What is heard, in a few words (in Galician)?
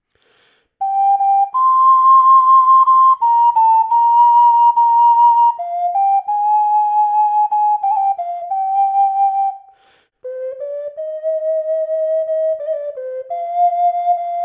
ocarina